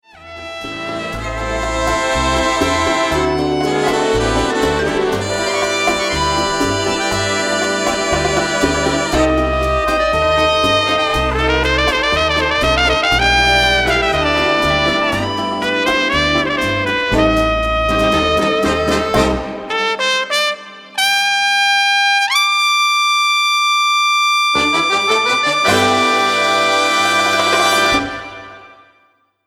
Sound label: trumpet